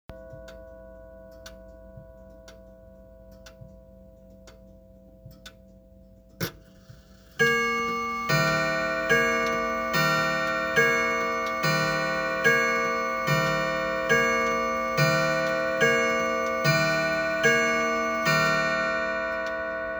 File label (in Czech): 2 tonove pulove podlahove, Sloupové hodiny; Adler 10017-W - Sloupové hodiny s půlovým závažovým strojem od německé firmy Hermle